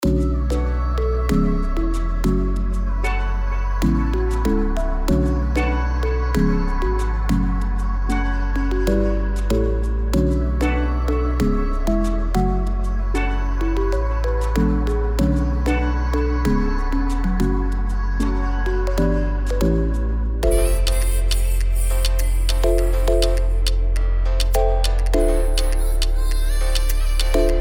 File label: Dancehall; Dancehall Gems